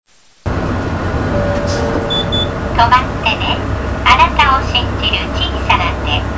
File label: bus tape orgel; aichi; chitabus